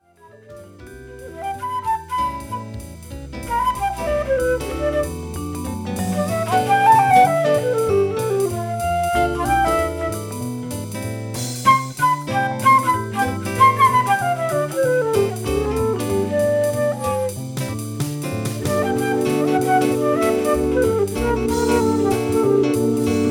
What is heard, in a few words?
jazz Flute improv; Nuvo